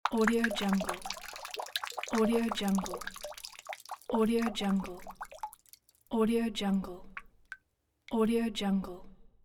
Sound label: pour water